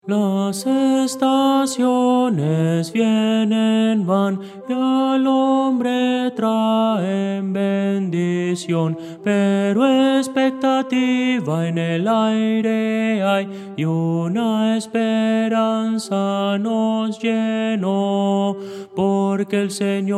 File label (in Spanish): tenor